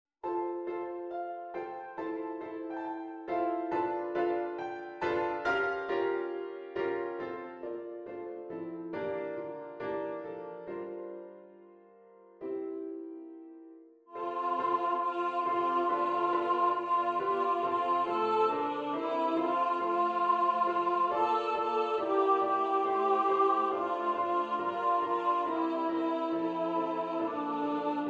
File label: ALTO